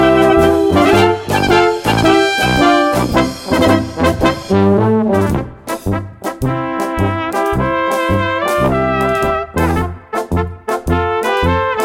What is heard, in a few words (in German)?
Polka, alpenbrass